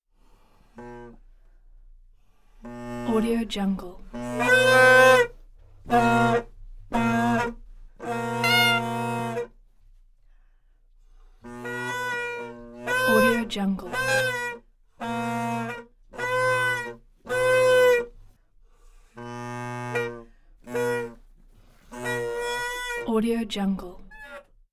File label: bagpipes squeezing and punching bag; prosoundfx